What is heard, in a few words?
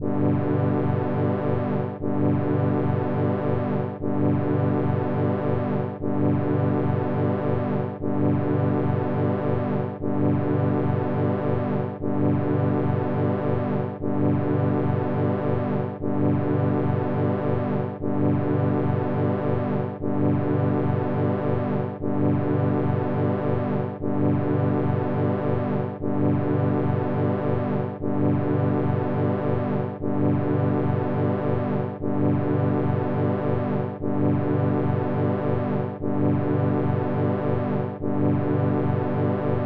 steady brass